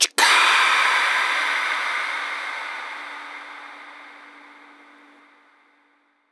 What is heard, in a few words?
Reverb Athmospheres, FX Vocals; Vengeance Samples